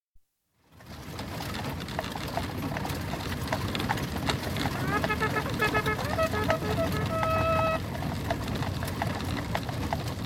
Stagecoach & Four, interior, travels over various surfaces with open window, post horn heard at top of track; Norwich Union; Car Sound Effects; BBC